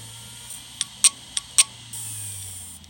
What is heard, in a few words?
abnormal sound